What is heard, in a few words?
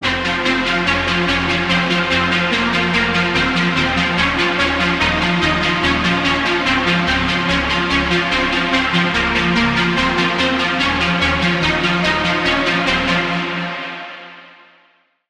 Lead; Mind Echo; Routed Sound; Vital Expansion - Reality Maker Vol.1